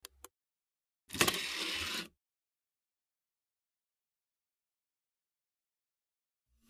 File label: Cd Rom Door Open Tray, computers